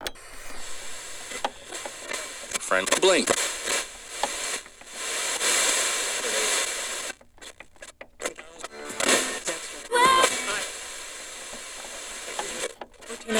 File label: Electronic FM Radio Station Change